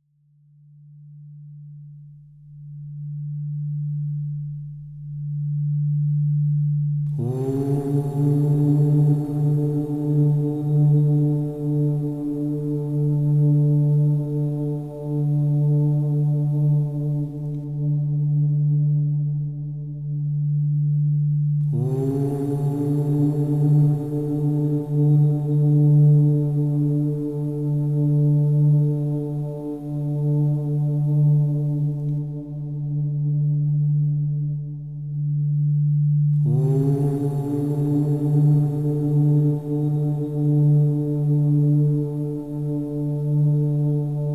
overtonal singing; Yoga and sound

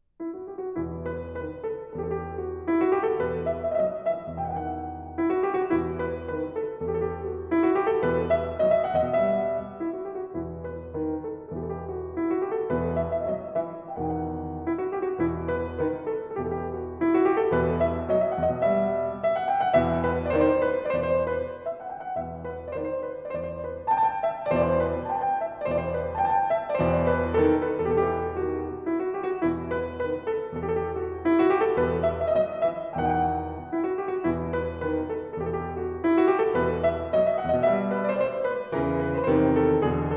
Tambourin, Keyboard Suites; Rameau

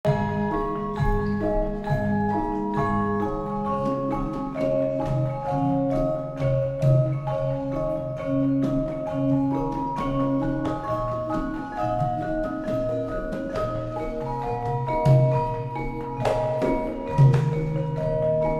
Javanese Gamelan; Sampak Sanga; Indonesian

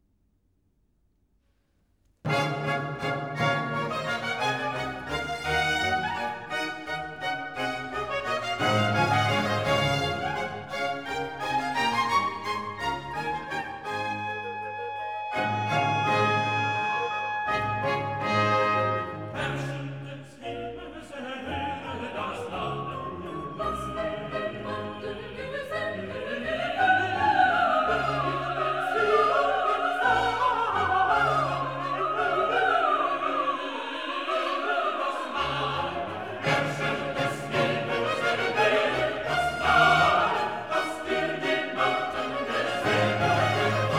Chorus; Herrscher des Himmels erhцre das Lallen; BACH; Christmas Oratorio

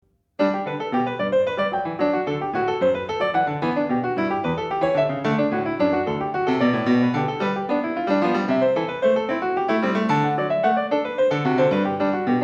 Bach Goldberg Variations a 2 Clav, PIANISTS

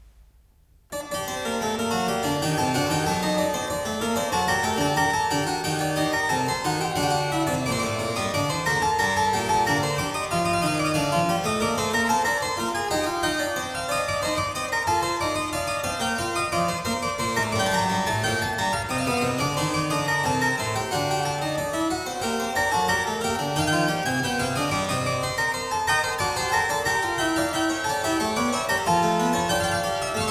Courante; ENGLISH SUITES; English Suite No.3 in G minor; BWV 808; BACH

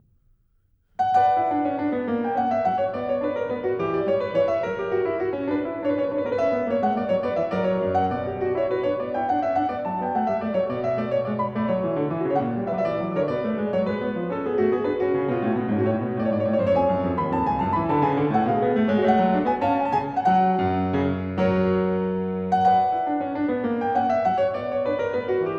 Courante, KLAVIER; French Suite #3 In B Minor; BWV 814; BACH

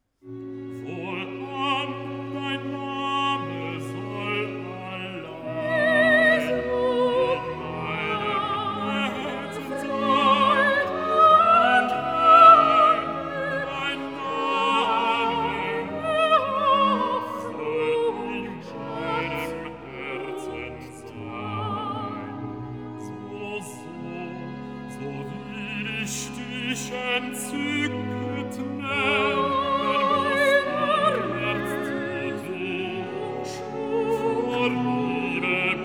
Recitativo (Basso); Wohlan, dein Name soll allein; BACH; Christmas Oratorio

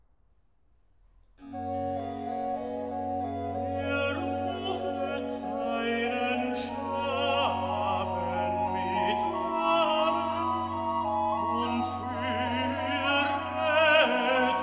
Recitativo Tenor; BWV 175; Er rufet seinen Schafen mit Namen; BACH CANTATAS

